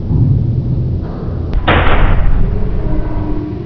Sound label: pump stop